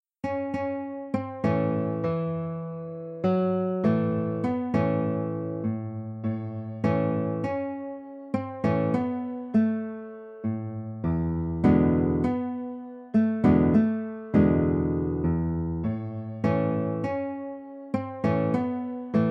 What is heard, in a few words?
Down By The Riverside Guitar